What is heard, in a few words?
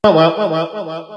fahh wowowowo